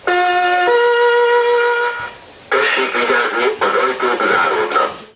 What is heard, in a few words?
Lock Chime Retro Metro